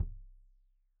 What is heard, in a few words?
Kik Stereo; Deeply Sampled Drums; SMDrum